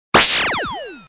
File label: laser shoot